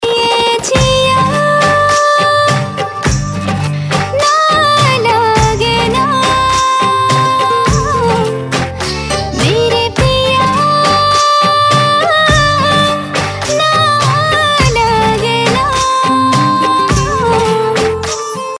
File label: Female Version